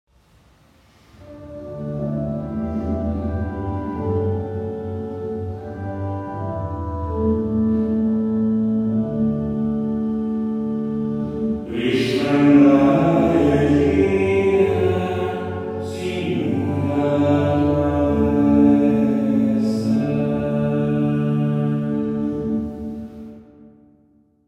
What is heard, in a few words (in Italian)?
Salmo Bassi; 15 Agosto; assunta